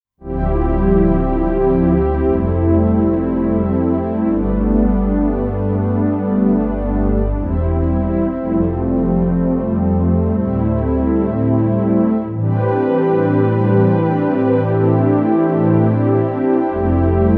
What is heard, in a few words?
Sequential Circuits Prophet T8 Sample Playback mit ARS10